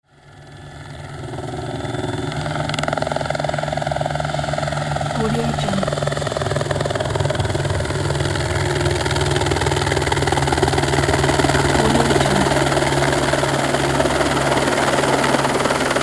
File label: helicopter landing